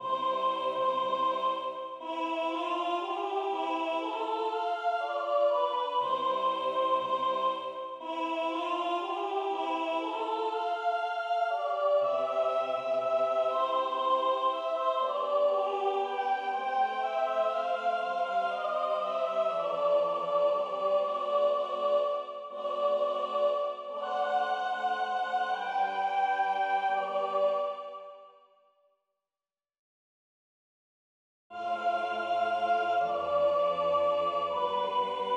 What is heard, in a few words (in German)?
Gloria mit Soli Sopran